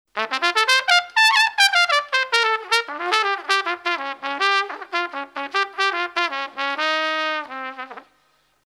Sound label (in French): trompette